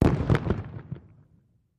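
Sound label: 2 Oz Black Powder Bomb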